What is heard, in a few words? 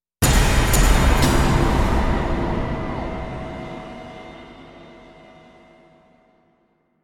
shudder, soundscapes